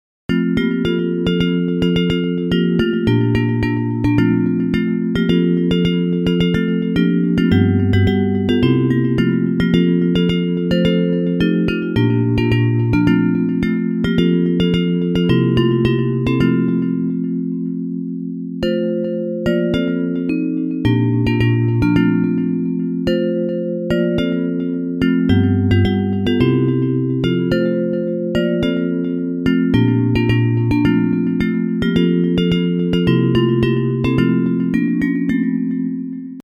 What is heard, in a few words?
Bells, Hymns